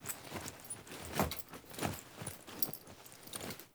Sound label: Foley Action Walk Cough